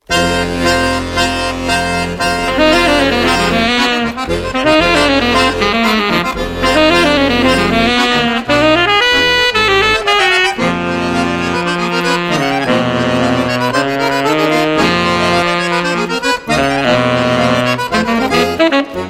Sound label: sax akkord, accordion